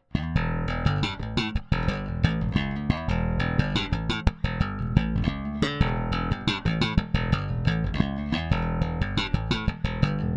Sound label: direct bass